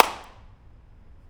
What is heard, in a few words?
conference auditorium, Impulse Responses, Reverbs; BU Reverbs